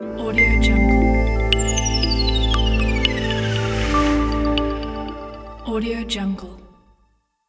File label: technologic logo